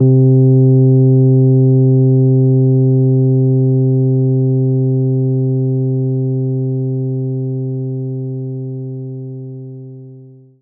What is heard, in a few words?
Rhodes C2; knights try